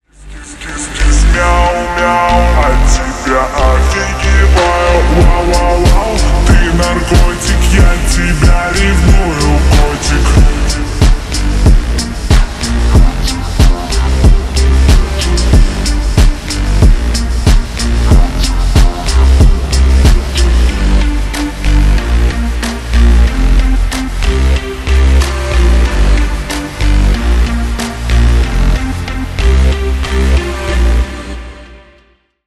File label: slowed reverb